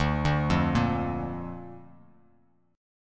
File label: D Minor Sharp 5th D down Bass Standard